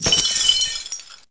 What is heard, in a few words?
Player drop tokens; DunDef